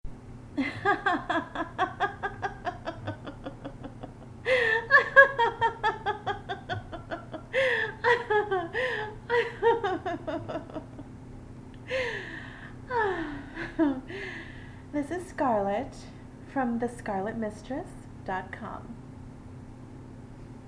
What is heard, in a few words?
scarlet laughter